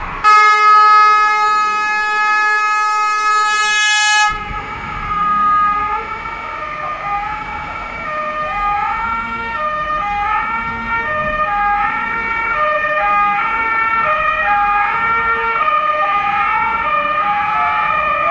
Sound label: building destroying becau; danger